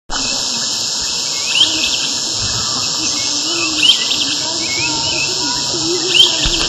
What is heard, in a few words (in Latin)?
Myiodynastes maculatus; PN PreDelta